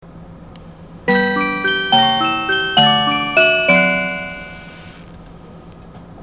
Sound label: Yakumo chime